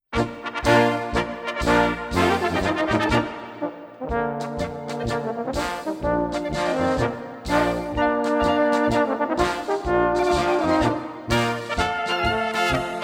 Instrumental

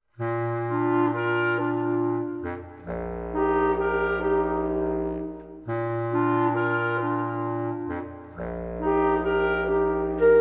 acoustic praise, instrumental

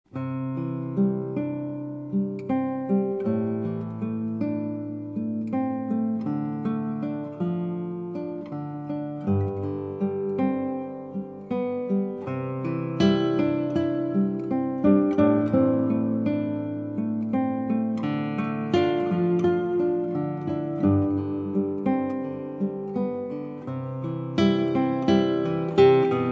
acoustic praise, instrumental

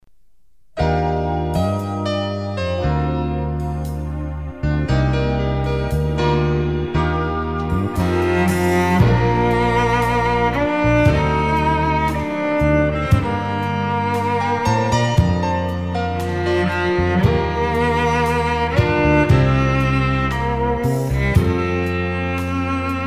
Cello

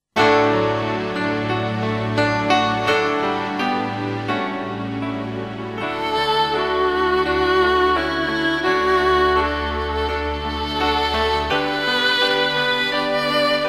Violin

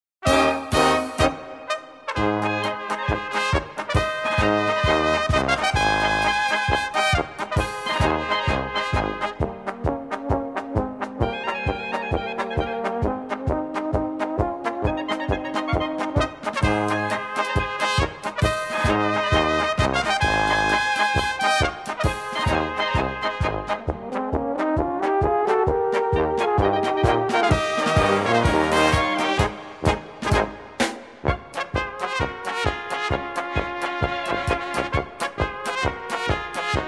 Instrumental

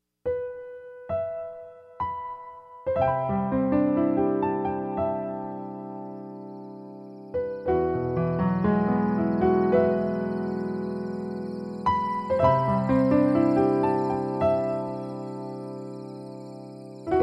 Acoustic Praise Instrumental

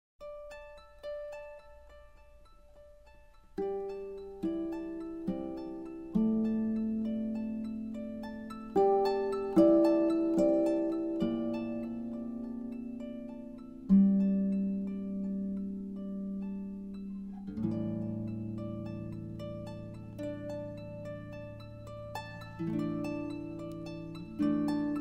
Instrumental Harp Praise

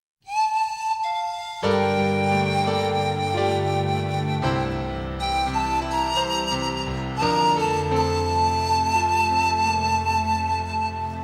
Flute

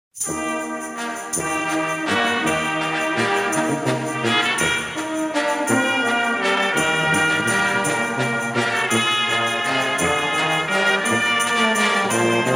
Vals

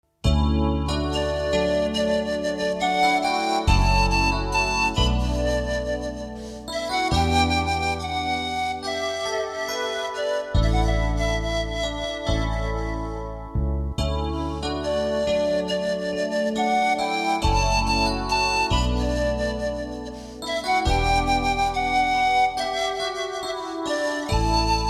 Flute

